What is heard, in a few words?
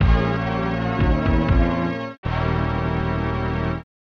Lose Life; power piggs of the dark age; snes